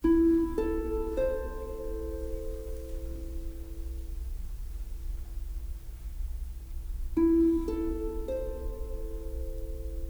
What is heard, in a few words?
strings polonaise, Sad; Loops